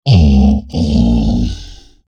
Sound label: die, troll